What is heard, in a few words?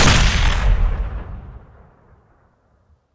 heavy cannon bolt, weapons